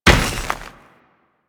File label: Moa Combined Step Dirt, Footsteps; Enemies; Warframe